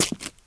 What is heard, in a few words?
concrete; firefight